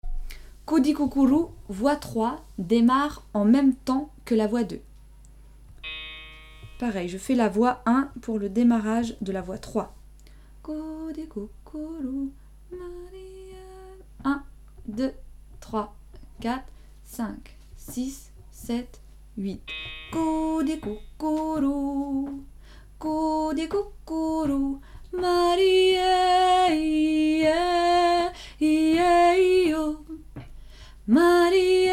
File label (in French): voix, chant d inspiration pygmées